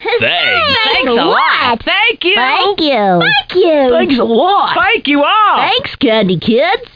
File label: End Chorus; Candy Land Adventure